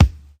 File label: loud kick drum sound c sharp key; kick drum samples